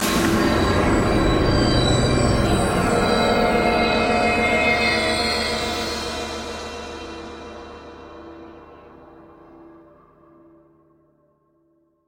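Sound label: Production Element Hit Cymbal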